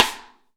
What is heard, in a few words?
Real Mega Drums; Best Service; Real Mega Drums VOL-1; SN AMBIENTEC